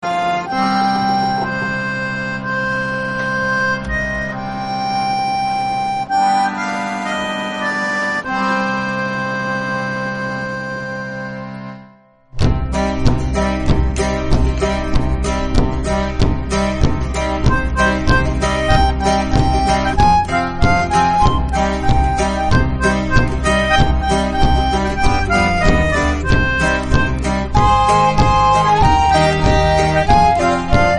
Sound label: sea shanty medley instrumental sea shanty bgm; The Wellerman